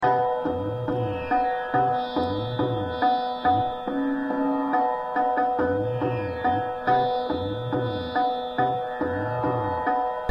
Sargam Dha Sa Re Ga Re Sa Re Ma Pa Dha Ni Trital, Sindhura